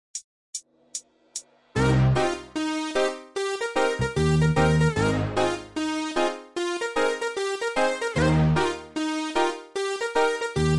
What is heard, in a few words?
siren beat